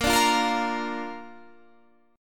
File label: Bb Major, Guitar, Standard; strummed; JGuitar